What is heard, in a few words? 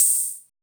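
CR-78 open hi-hat; POP-ROCK2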